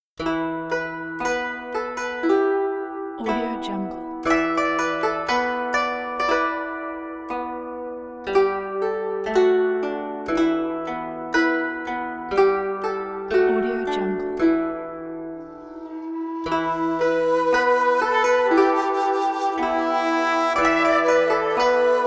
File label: asian traditional japanese flute